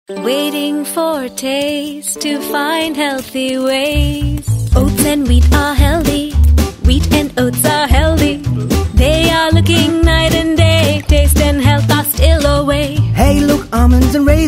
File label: TV ad song; Sunfeast Farmlite